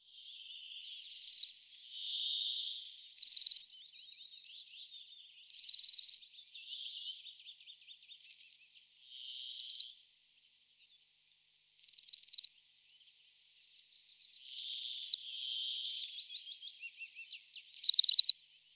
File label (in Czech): Brkoslav severni Bombycilla garrulus